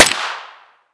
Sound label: fire suppressed pm, weapons; cw makarov